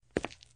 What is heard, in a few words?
concrete hit